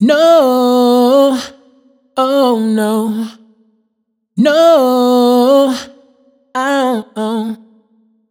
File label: riff no 110 A wet, Vocal; Slate Digital Omnivox Vocal Sample Pack